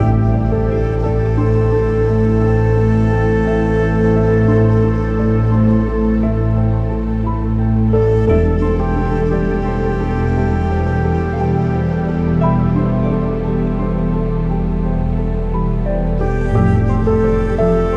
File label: Library Orchestral; Audio Animals